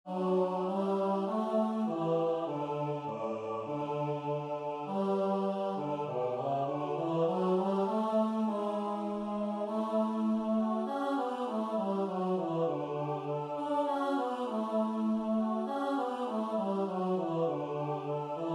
lyd Bariton SAB; Dejlig er den himmelblå

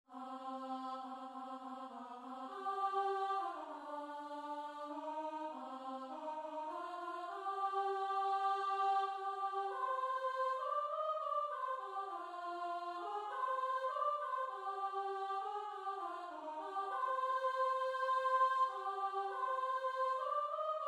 sop melodi

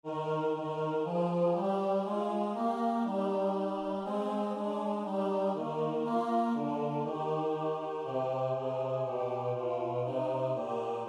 Bariton; Juletræet med sin pynt SAB

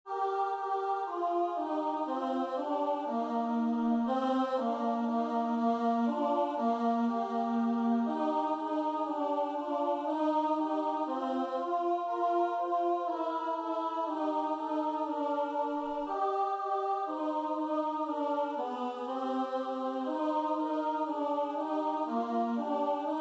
lyd Alt SAB; Juletræet med sin pynt

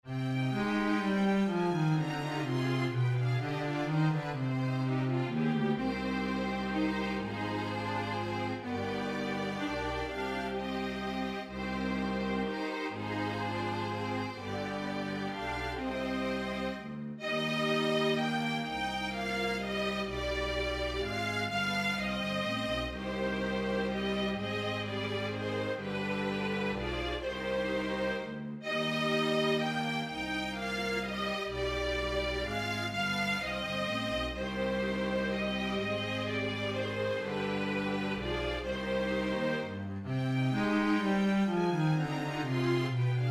Ny instr lyd Ukrainsk sang

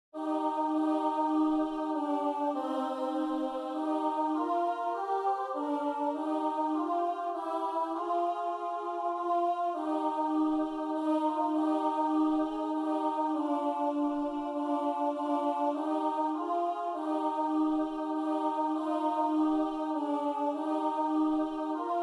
Alt, Det kimer nu SAB

